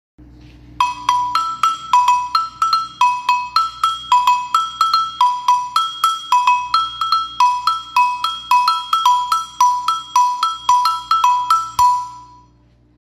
Agogo traditional small

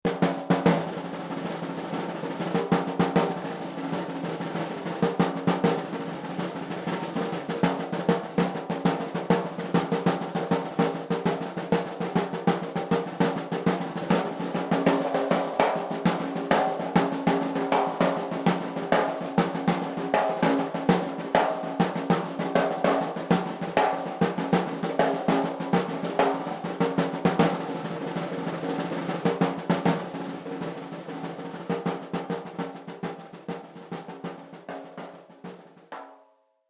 caixa maracatu

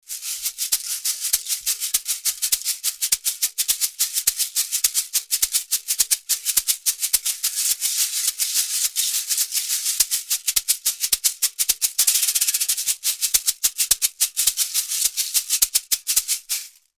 barravento; mineiro

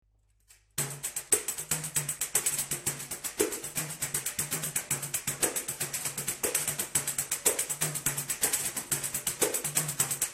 pandeiro

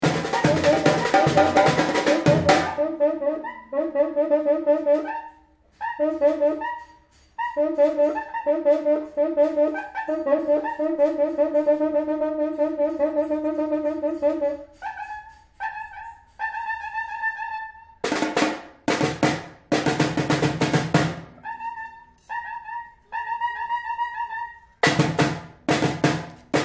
cuica

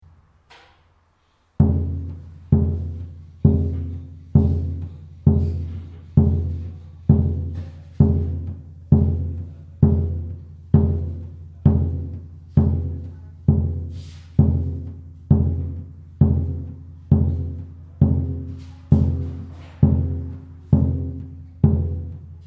surdo; ivsom

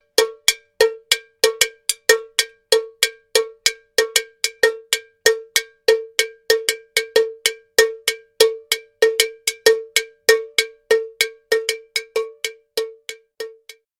gongue klein

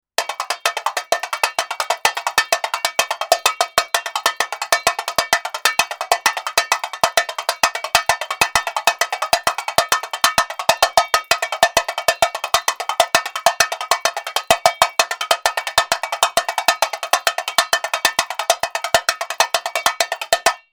TAM

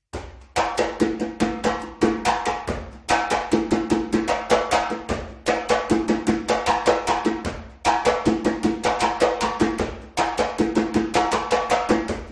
timbal

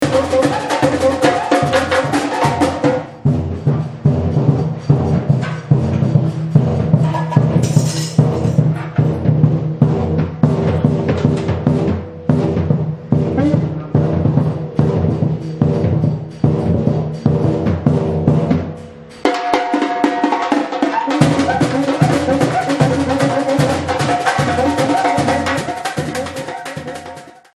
surdo

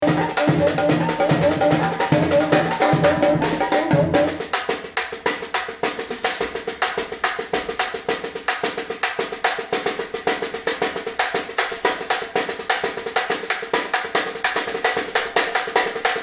caixa

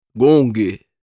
Gongue

